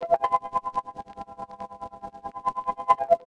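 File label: Pad Synth; Equipped Music; Loungin House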